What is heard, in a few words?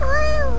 kirby noise